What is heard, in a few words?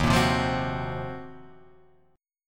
D sharp Minor 6th Add 9th D sharp down